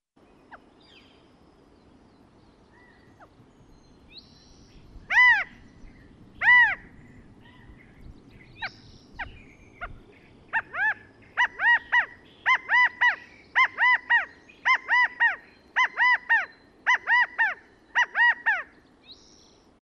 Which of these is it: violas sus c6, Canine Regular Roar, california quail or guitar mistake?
california quail